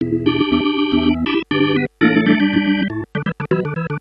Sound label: Pirate Organ Riff